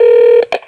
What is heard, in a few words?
Phone In